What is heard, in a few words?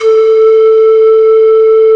LED, S-LEAD; AKAI S-Series CD-ROM Sound Library VOL-8